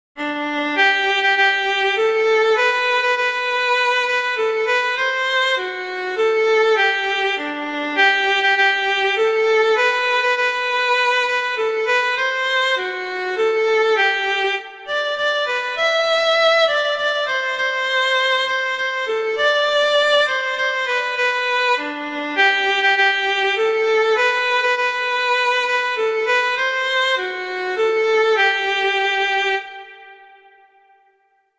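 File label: O Christmas Tree, Violin; Christmas Beginners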